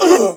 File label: pain, male; bootsector male; midnight guns; bootsector male 00